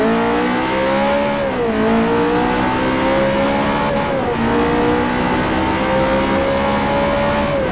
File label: second, vehicles; 458; TDMCarpack; TDMCars